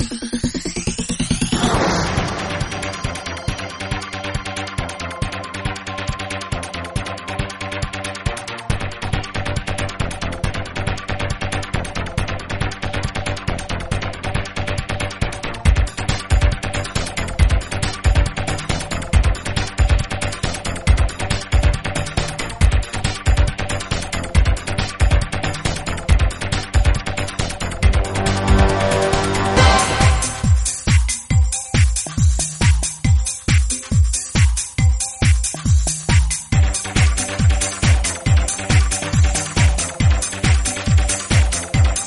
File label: music shiny tech; Gotham City Speed